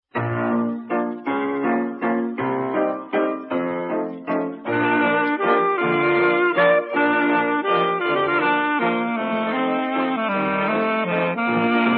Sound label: Waltz